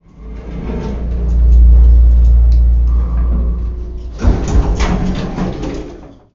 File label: elevator ride